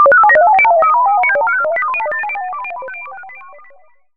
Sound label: sci fi; Masterbits - Switch FX